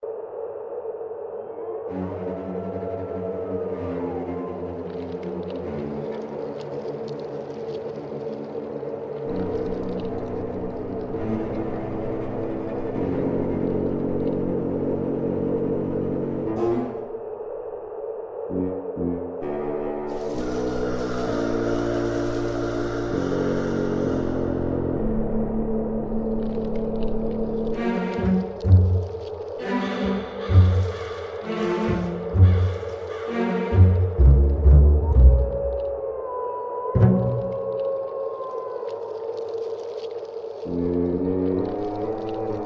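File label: Cemetery Instrumental Ambience; mahluk dark demon; ps4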